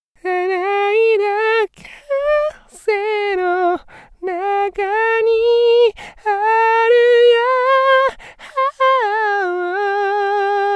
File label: vocal